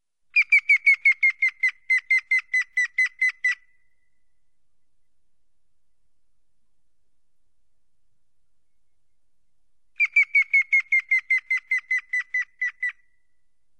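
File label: grey-headed woodpecker voice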